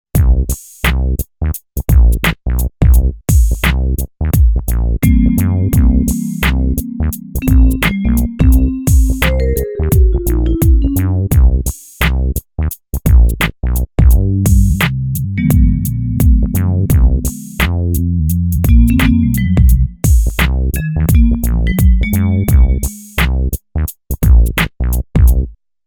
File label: Sweet RnB Vibe